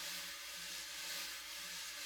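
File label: slow brush; Northstar - New Gold VOL-2; 30S BRUSH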